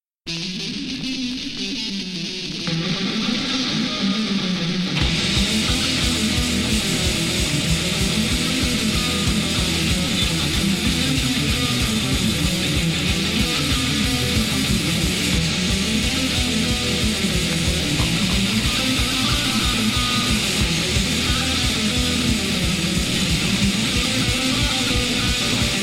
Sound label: Death Metal